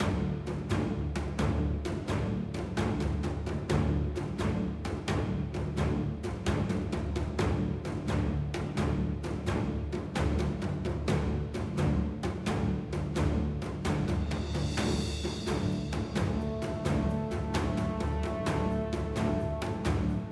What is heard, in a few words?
Action 2 (Loop), Rpg Game Tracks; 25 Rpg Game Tracks